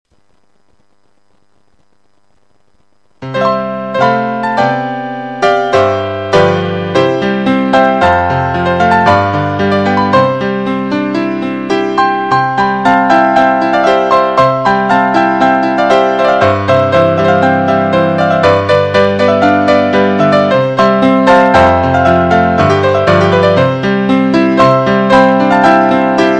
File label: Christmas Piano Joy To The World